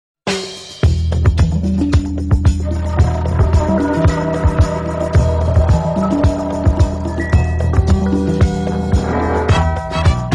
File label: Rain Forest